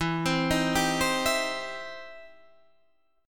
E Minor Major 7th Sharp 5th, E, down, Guitar, Half Step Down